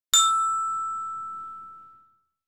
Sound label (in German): glockenspiel (c-dur-tonleiter) pro ton ca. 3 sec.; geraeusche digital